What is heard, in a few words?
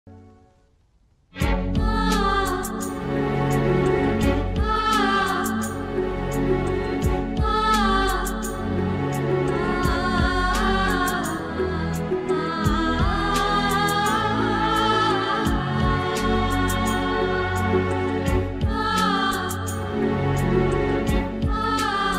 kannada movie bgm powerful bgm love bgm; om kannada movie